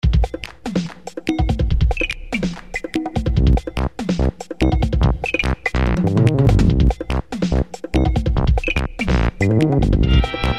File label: Quirky